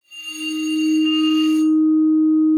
arco D#4, vibes; Xsample VOL-4

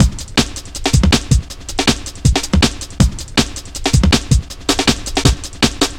160, Drum Loops; Total Drum&Bass

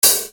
Hat, EuroDance; Best Service; Ethnic Journey Sound Cube; EuroDance Essentials

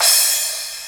CRASH, Dance Mega Drums; Best Service

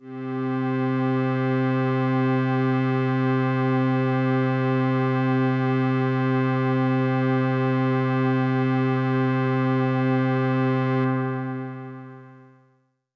Pad C, Synth Prophet One Shots; Electronisounds

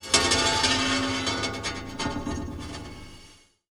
Delayed, Wire & Tin, contact mic

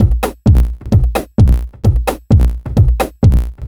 4 floor beat, trance

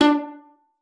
D4, VIOLA PIZZ; Xsample VOL-5; 90 s Sample CDs

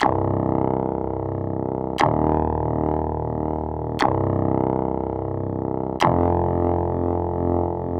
Grog Bass; Sonic Foundry (Sony Creative Software); The Electronic Music Manuscript Collection; Bass Foundations

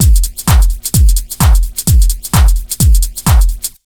BEAT, Disco House; USB Soundscan vol.20; Fresh Disco House I; AKAI

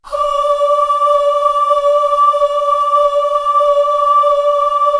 cho d3, Choir AHH, KORG DSS-1; ProSamples vol.55 - Retro Sampler

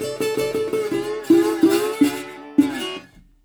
120, Blues Guitar; ILIO - Fretworks

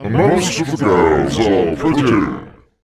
VOICE FX, Dance Vocals

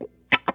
CHUCKA DICE, Funk Gtr & Wah; PlatinumPhattCD2; E-MU Producer Series Vol. 8 – Platinum Phatt

